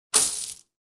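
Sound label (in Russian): wallet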